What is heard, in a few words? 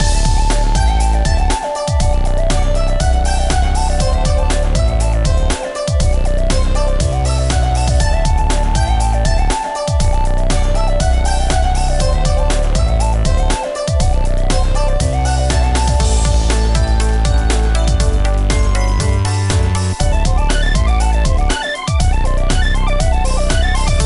Music Dance; Littlest Pet Shop 3 Blue Team Pink Team Purple Team; NDS